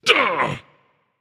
grunt hit; smite; ahmuzencab

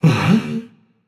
surprised, voicepack; smite; jorm

